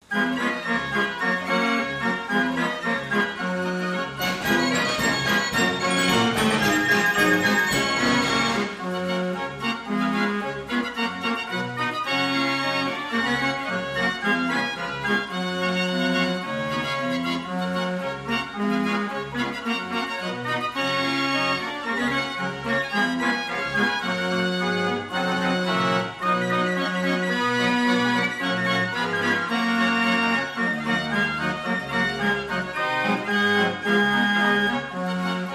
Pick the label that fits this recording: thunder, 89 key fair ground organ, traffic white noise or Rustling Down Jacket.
89 key fair ground organ